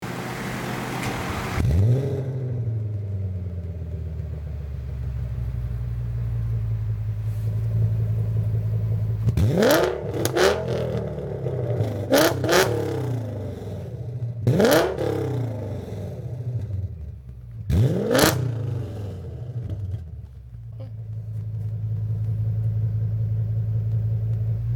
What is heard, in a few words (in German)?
Audi S8 Klappenanlage